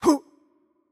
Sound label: Attack Light; bloom minigame